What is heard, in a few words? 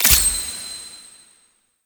UI Foil Mod Install; Mods